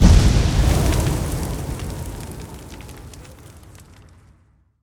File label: Fire Spell Impact; AudioWizards